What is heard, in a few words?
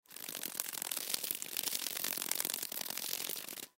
Insect Bug Beetle, Insect Bug Beetle Wings Shake Buzz; Animal; Insect Sound Effects